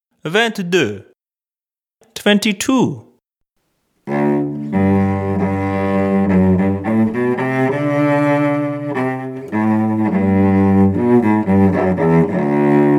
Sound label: Cello